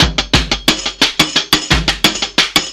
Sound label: whipcracker, drum loops